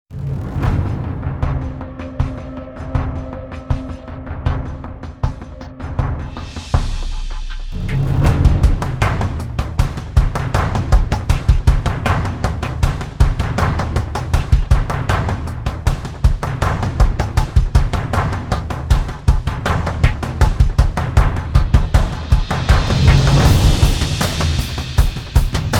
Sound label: Drums Only Version